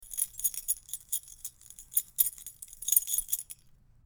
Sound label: Keys jingle; Sound FX